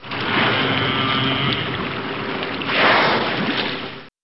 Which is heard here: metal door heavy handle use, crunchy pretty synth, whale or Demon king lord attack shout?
whale